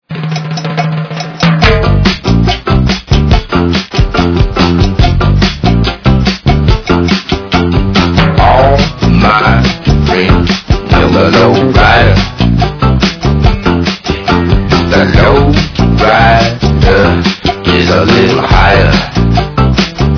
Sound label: lowride; tfc